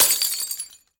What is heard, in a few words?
Glass Smash; Nickelodeon - Destruction Truck Derby